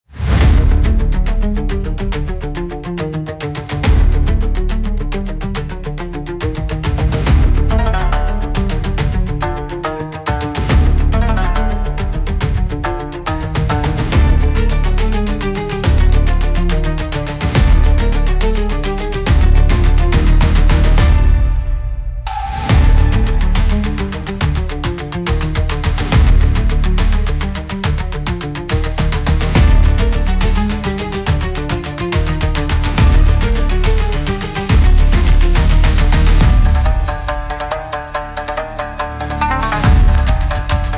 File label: Thunder Gate Pursuit, cinematic japanese style battles